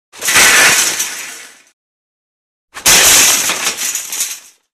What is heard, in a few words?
Metal Crashes Thin Thin Metal Impact With Light Debr; Hollywood Edge Foley Sound Library